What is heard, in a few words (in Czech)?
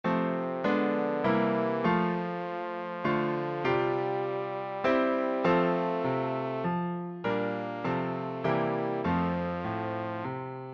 koleda trombon; Chtíc, aby spal - trombón; vanocni koleda